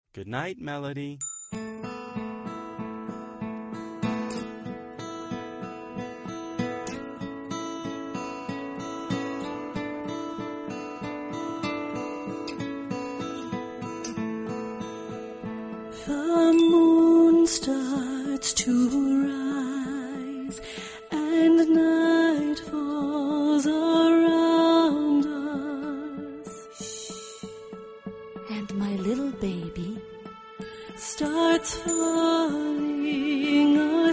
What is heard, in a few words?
melody